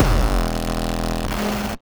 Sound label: kick E